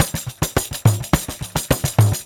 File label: Pandeiro, 4-4 Drum Loops, Perc Loops; Drum Tools